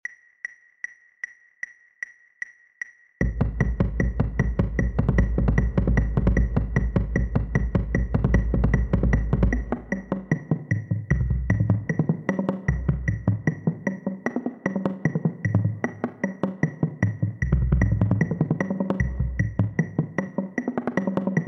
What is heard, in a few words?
sequence para bass